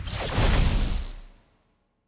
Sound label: Orbital Weapon Dissapear; TANK; 3Souls